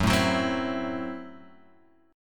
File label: Gb Minor 7th Flat 5th, Guitar Open G